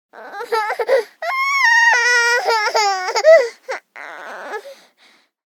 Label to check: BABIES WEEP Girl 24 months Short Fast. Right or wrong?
Right